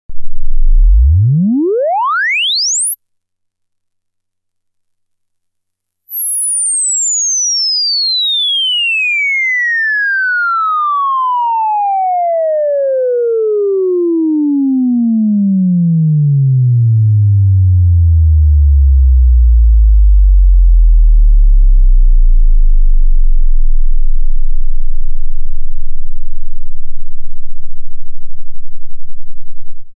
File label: roland juno-106 sounds sweep